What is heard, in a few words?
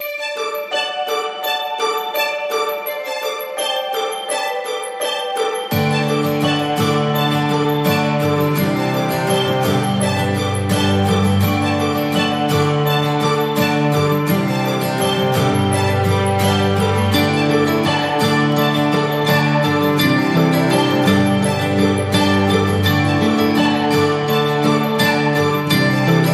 No Drums No Melody